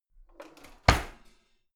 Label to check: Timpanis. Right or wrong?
Wrong